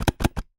Camera Sequence

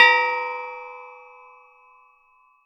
Metal Teapot Stand Strike

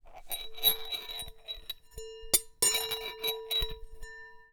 Marble Spin, Large Wine Glass

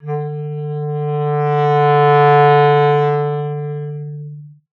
Clarinet

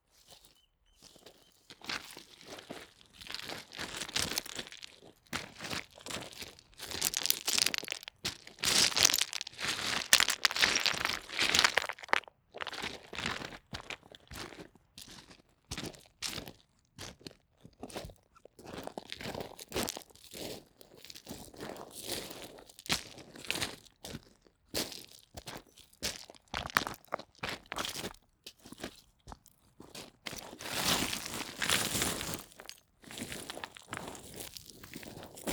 Pebbles Footsteps, Beach

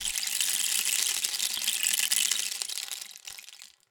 Rain Stick, Percussion Samples

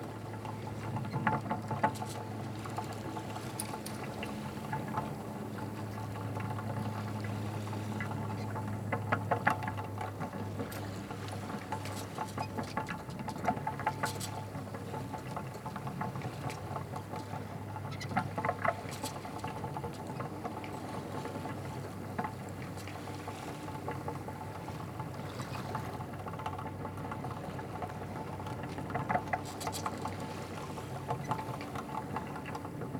Sailing

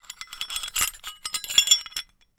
Hole Saw Rattle, Hole Saw Bits

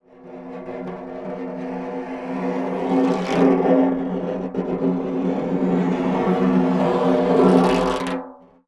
Snare Drum; Percussion Samples

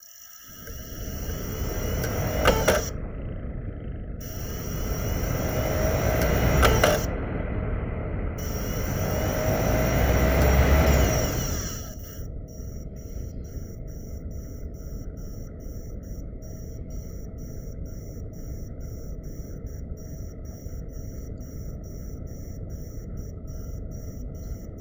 Spin Up, DVD Drive Internal

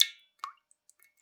Drips

